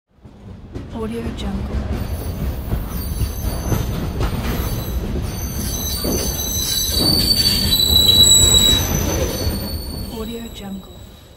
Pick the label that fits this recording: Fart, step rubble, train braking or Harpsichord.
train braking